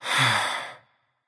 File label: nv sigh; smite; voicepack; mercury